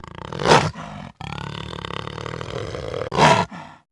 Baby Jaguar Roar Sound; Baby jaguar roar sound effect

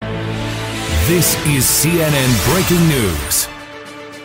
breaking news; cnn

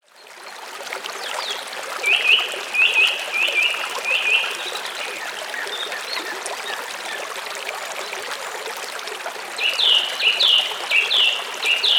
Best play soothing sound

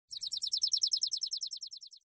Sparrow sound Notification

